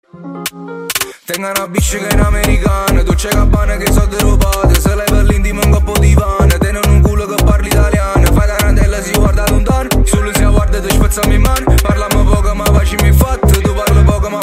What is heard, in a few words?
Motorola Italian Remix